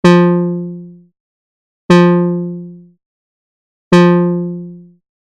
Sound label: ADSR